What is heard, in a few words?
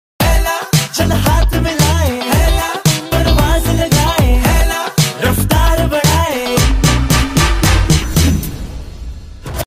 song ringtone; heropanti 2